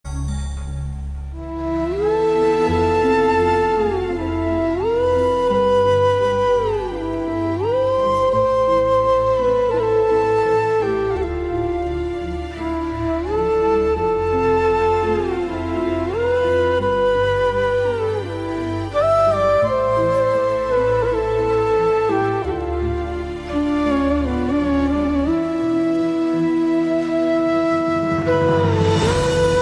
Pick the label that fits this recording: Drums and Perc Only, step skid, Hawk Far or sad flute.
sad flute